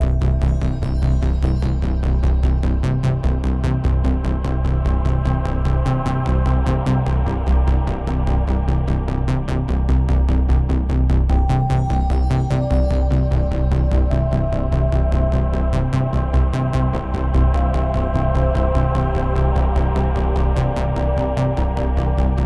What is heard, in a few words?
Omega Scan FX Stem Loop